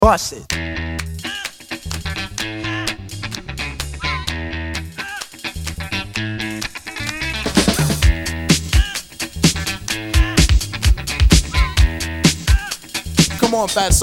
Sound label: Dance Sample Simple